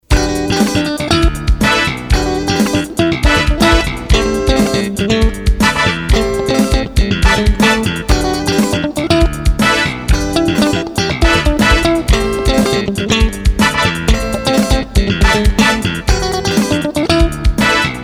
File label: jazz